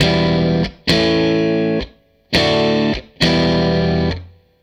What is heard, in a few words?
Strat Guitar Loop